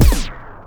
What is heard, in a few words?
SCRATCHES; Scratch 1